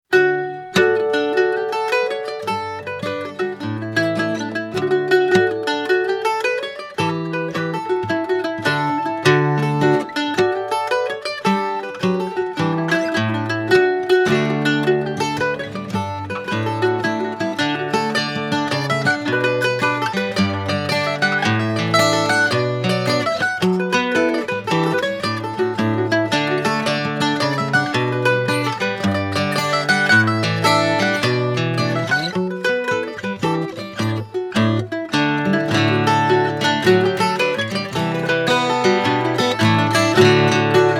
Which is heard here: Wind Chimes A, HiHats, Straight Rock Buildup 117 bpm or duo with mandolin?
duo with mandolin